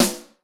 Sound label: Snare, Yamaha RY20